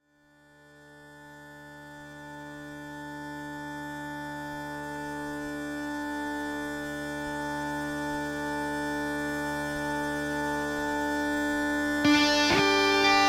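heavily distorted Revelation Jaguar electric mandolin; The '98 Set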